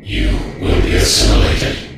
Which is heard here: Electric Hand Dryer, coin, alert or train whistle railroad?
alert